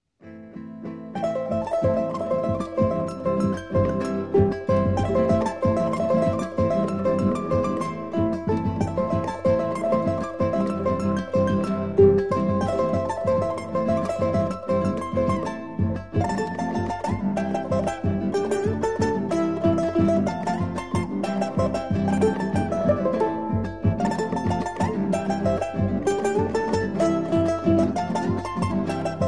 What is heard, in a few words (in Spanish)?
bailecito de la abeja, flauta guitarra y arpa de los andes